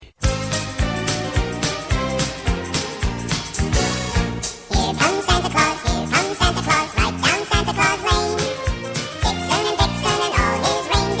nhac giang sinh

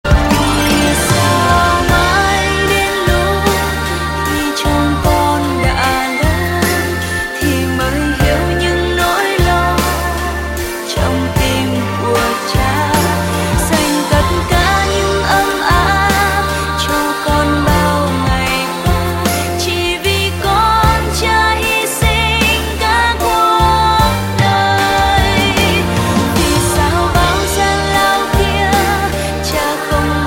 Nhac Chuong

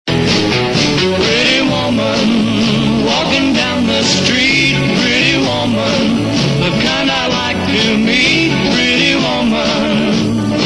quang cao; diana

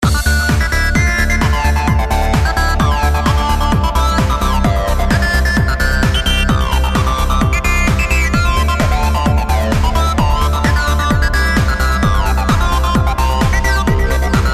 psytrance soi dong

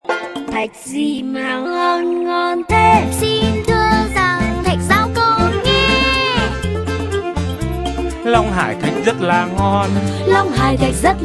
quang cao; thach rau cau long hai

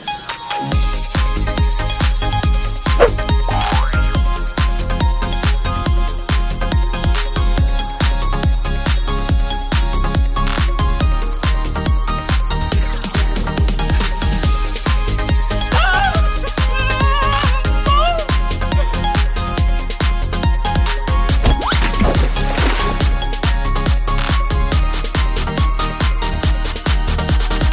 Nhac Chuong Khong Loi Remix

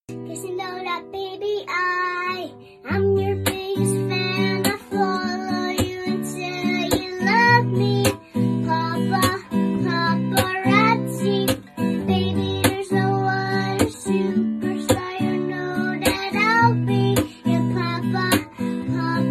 Nhac Chuong Tiktok Cute Song Cover

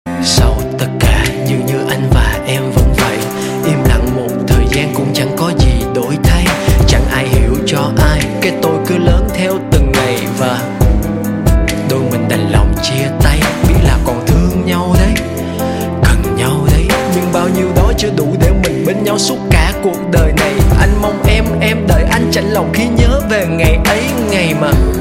rap

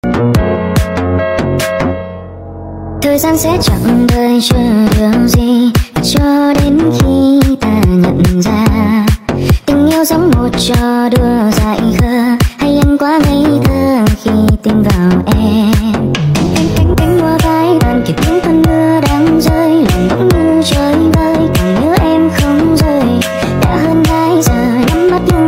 speed up Nhac thinh hanh TikTok

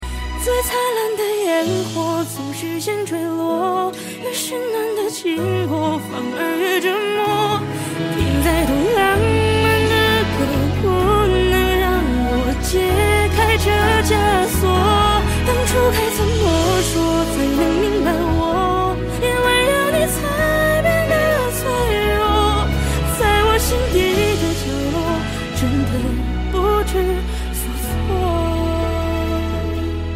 Remix Nhac Hoa

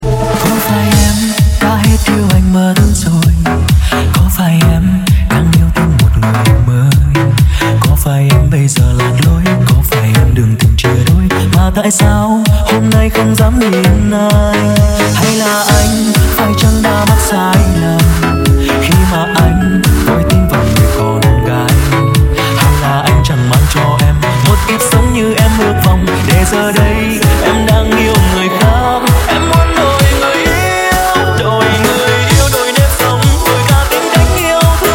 remix

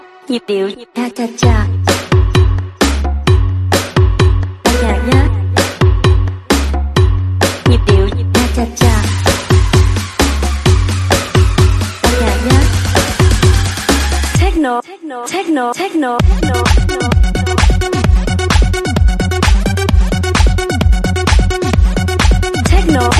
Nhip Dieu Cha Cha Cha

